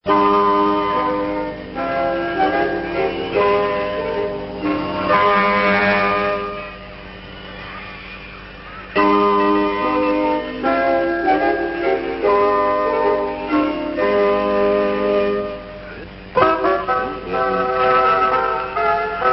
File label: interval signal; radio sofia 1964